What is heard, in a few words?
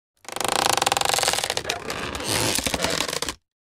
Coffin